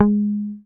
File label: MUTE G#3, Bass; Sampleheads; Will Lee Bass Library